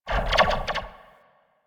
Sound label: Zipline Random Slow; Gadgets; Parkour; Warframe